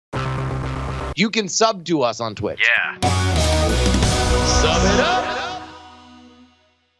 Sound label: twitch chant shorter; namechant